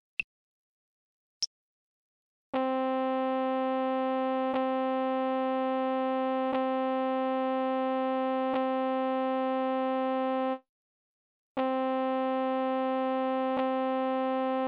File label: c trumpet